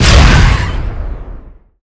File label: virus explode; garrysmod